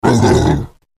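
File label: free spins grunt shake head; wild worlds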